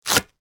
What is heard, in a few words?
household disposable nappy side tab open